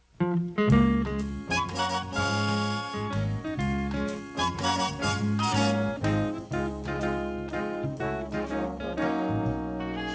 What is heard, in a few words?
guitar theme intro; bradybunch